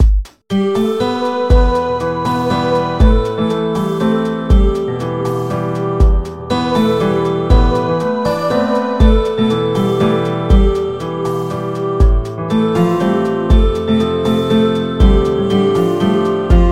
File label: keyboard